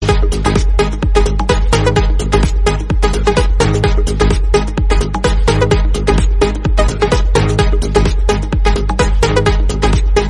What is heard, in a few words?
Hollywood Remix BGM Ringtone